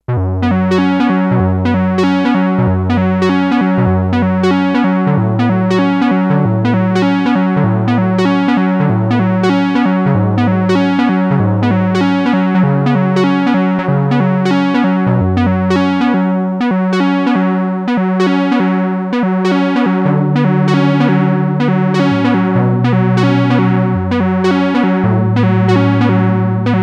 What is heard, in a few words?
4ths drop with release, Sequential Circuits Six-Trak; Factory Programs